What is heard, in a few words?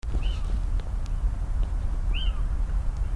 little owl wrabness reserve